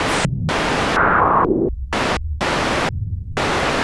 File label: SH101 Clocked LFO Rhythm (125BPM)